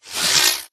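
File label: Drone Movement Turn; Titanfall 2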